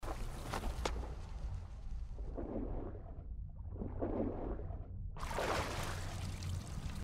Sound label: water distortion; gris